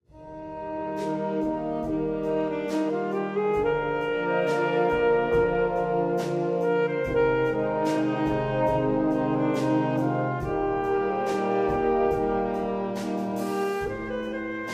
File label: Banda